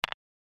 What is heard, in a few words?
Stone Landing; my cute commissar 2020 gamerip